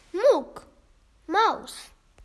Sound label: Mouse